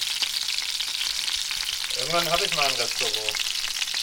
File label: restaurant, Cooking; Höchst/Frankfurt; Christoph Collenberg